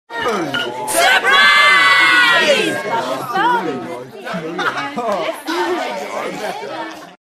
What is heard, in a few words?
surprise, Greetings; Arphiola ringtones